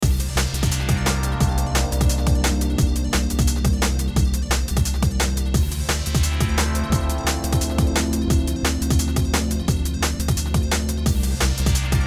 Electronic; Kleverb

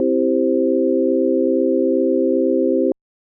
Sine Wave

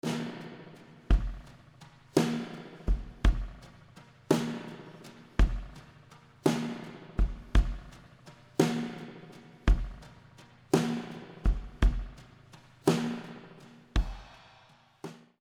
Springbox Snare; PSPSpringbox

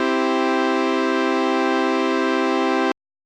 Saw Wave

